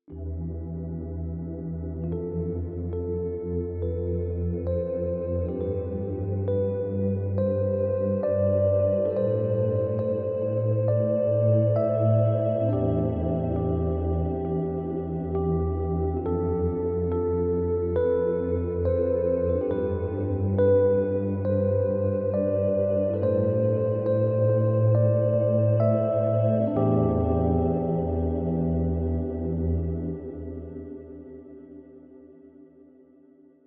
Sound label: Heavenly Pad; Heavenly Pads